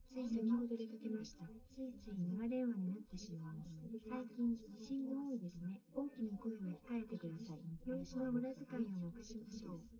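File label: female, live recording 130ms 1m; SiSEC11; underdetermined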